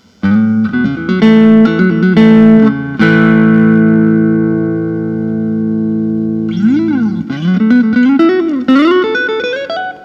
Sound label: dpergo signature limited se vintage white, Guitars Electric Semi-Hollow Body; bakersfield veneer; Tele-style